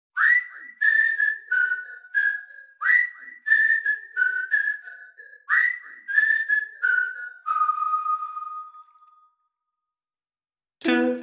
Welcome To The Day, Vocals Whistle Musicbox

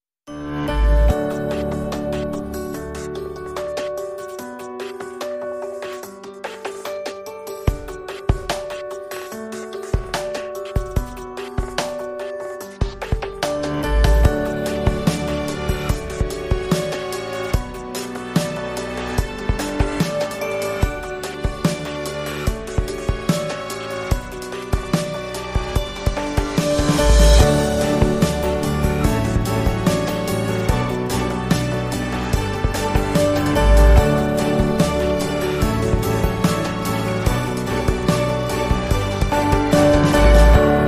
Modern Orchestra

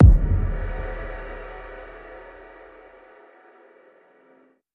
One Shot Hit

